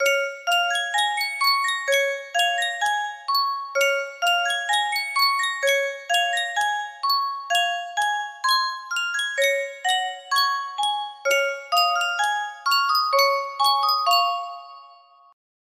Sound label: High Pitched, music box melody